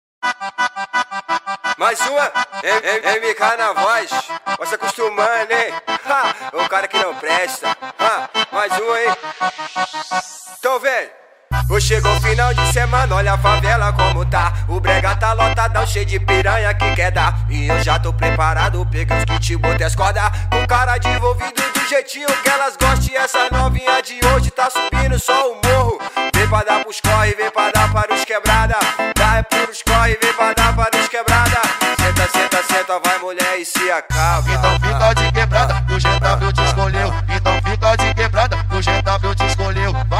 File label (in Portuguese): brega funk